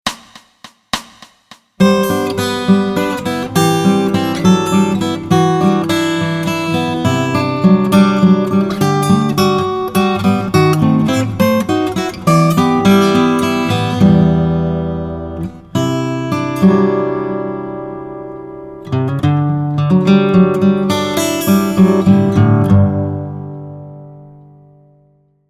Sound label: Acoustic